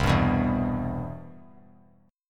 C Major 7th, chord; CM7 strummed; JGuitar

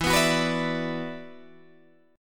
E 7th Suspended 2nd Guitar DADGAD; E7sus2; strummed; 14,14,16,16,17,14

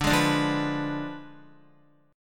D Diminished 7th D down Guitar; strummed

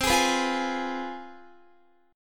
C Major 7th Suspended 4th Sharp 5th C down Guitar; CM7sus4#5 strummed

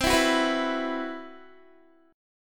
C Major 7th Flat 5th C, Guitar; strummed; JGuitar

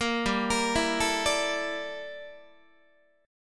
G Minor 6th Add 9th G down Guitar; Gm6add9; arpeggiated; 13,15,13,14,13,13

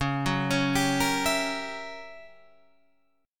Gb 7th Flat 9th Db, guitar

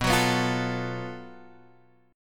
Gb Minor Major 7th Double Flat 5th B

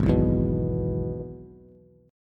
Ab Minor Sharp 5th, Bass; Abm#5 strummed; JGuitar

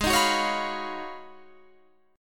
Ab Minor 13th, Ab, Guitar, Standard; Abm13 strummed; JGuitar

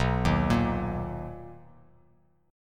B Major 7th B down, Bass Standard; arpeggiated; JGuitar

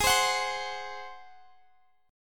Ab 7th Ab down Guitar Half Step Down

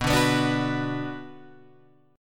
B Minor B Guitar Open G, chord

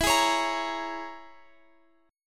E 6th Flat 5th, down, Guitar, Open F; E6b5 strummed; JGuitar

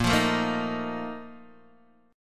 A sharp Major 7th Suspended 4th, Guitar, Open D

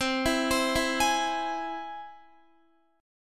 C Augmented, Guitar, chord; arpeggiated; x,15,16,17,16,16; 4F,4A,5C,5G,5C,6E

